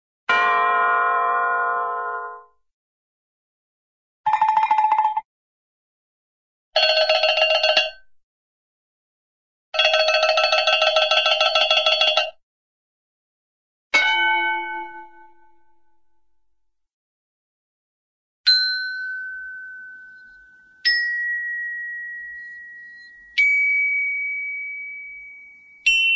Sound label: bell, big big bong; cartoon